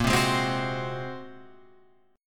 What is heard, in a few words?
A sharp 7th Suspended 2nd Sharp 5th, Guitar Open F chord; strummed; 10,7,8,7,9,7; JGuitar